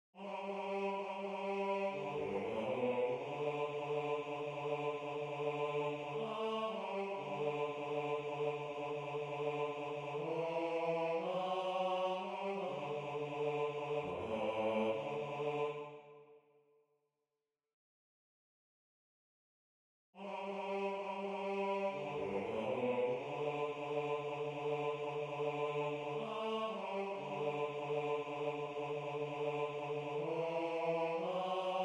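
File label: Bass, Religious Folk Songs Of the Negro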